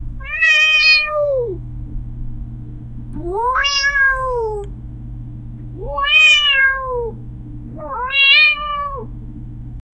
the cat meows wildly

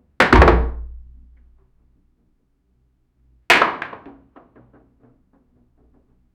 the heavy wooden crate cr, breaking

